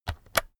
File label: keyboard external key press slow